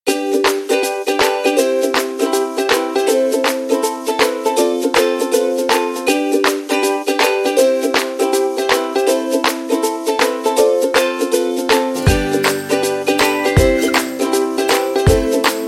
Happy Fun Ukulele; MIUI12

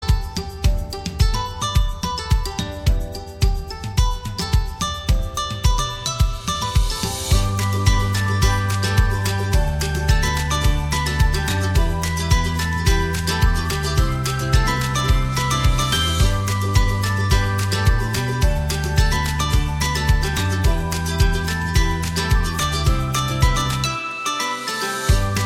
Happy Summer Tune, ringtone

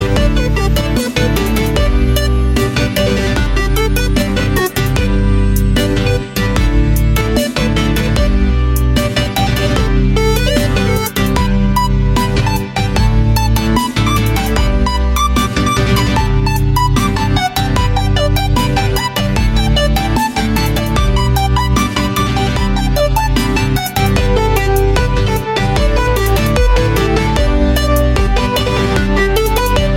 Instrumental

